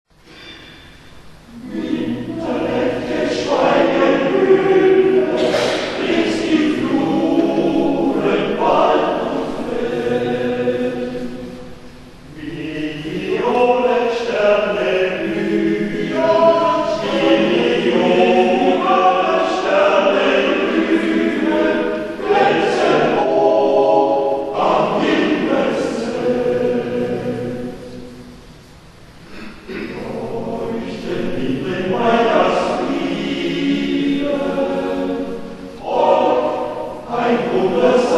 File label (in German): Weihnachtsglocken